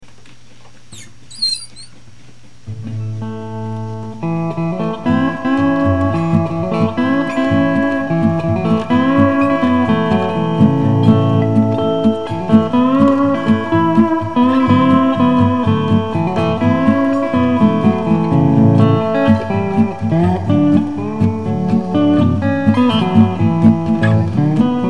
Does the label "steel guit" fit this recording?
Yes